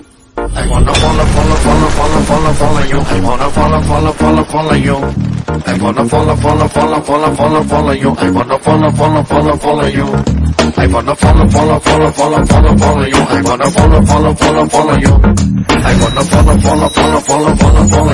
Telugu Ringtone

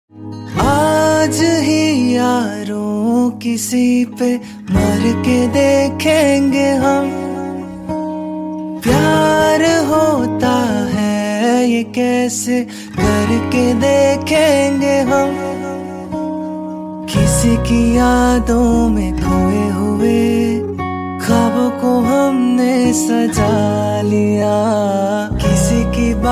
Ringtone

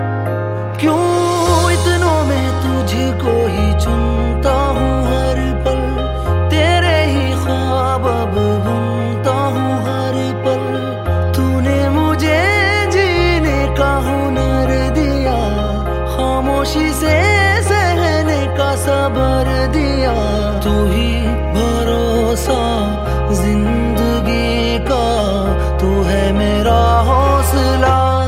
Hindi Song Romantic Ringtone

